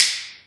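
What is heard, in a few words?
Finger snap, Roland MC-303 Percussion; Drum Samples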